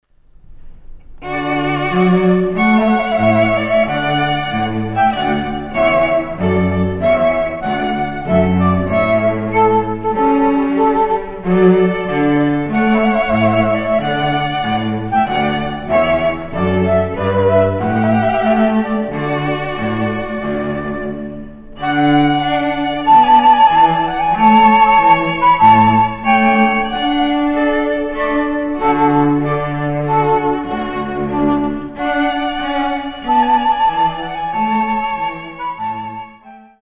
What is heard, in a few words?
trumpet